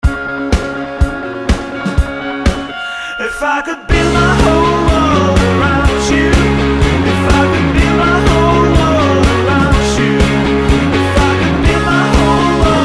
BACKRINGTONE; Cosmote

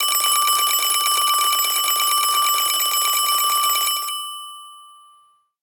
Twin Bell; Google; Pixel-4; Retro Riffs

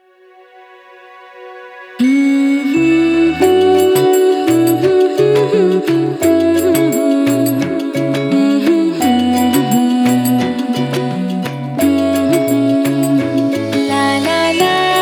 Vocal

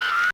Mech. Sound Zoom In; SKY IM-U680L; Camera

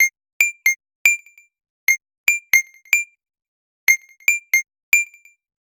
tennis, ringtones

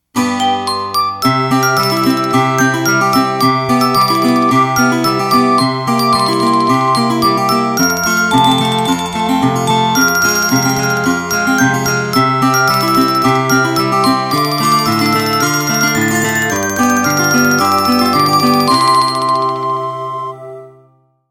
Ring Lively; Asus P526

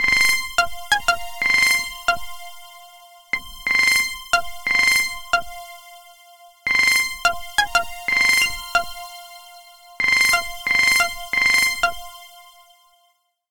ringtone; Google; Android Open Source Project

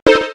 digital, keytone; SKY IM-A600S Sirius

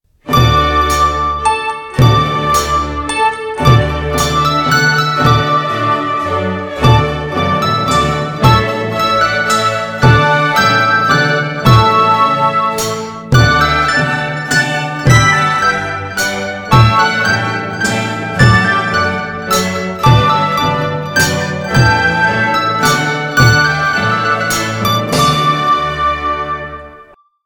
Royal Fireworks

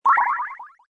call disconnect; Samsung SCH-i539

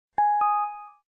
Longkey Feedback, Event sounds; LG GS390 Prime

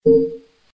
usb connect; Motorola Backflip

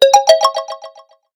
Swing, notifications; Huawei Ascend Y330

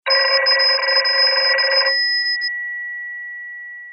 Classic tone, ringtones; Lenovo A536

